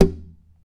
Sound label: TAP, FLAMENCO Guitars